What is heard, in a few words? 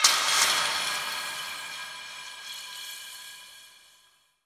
Shatter, Sound FX; Zero-G Smoke